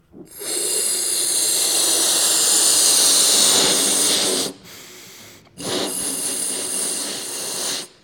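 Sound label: Balloon Inflating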